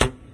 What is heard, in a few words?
small wire pluck, materials; Garry's Mod; hl2